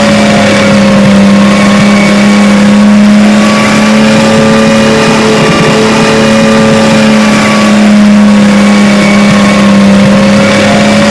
v12 petrol large, engines; acf engines

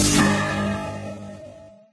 speedup

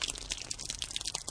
watering

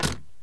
switch; vcmod

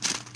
joint fold

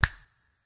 snap snap

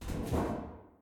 vent move

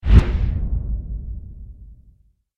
teleport; vadim blink

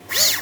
leg in; captainbigbutt; carbon miku

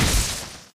froze done

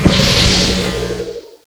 pickup; biva

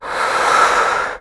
vape breath

